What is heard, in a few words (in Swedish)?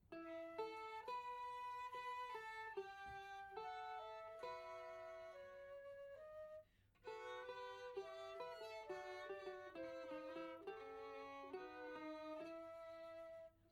Kristibrud 3 stämmor